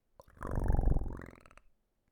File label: NOTIFICATION purr